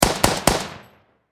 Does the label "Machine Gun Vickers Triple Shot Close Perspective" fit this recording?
Yes